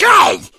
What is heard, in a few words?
hunter shred, attack voice; source engine; left 4 dead 2